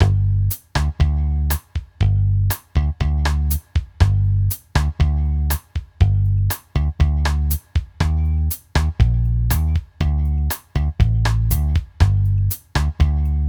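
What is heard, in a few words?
Drum & Bass Loop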